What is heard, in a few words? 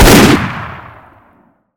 fire